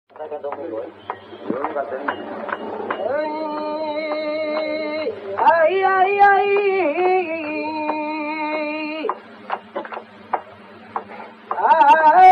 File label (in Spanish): Siguiriyas